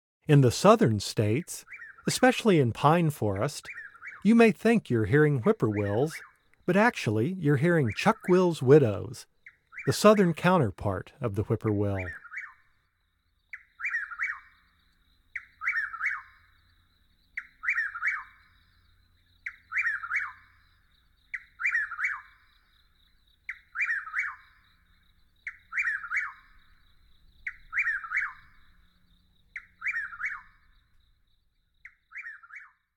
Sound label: Chuck-wills-widow; North America; Common Western Species; Know Your Bird Sounds